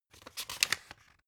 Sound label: old pick page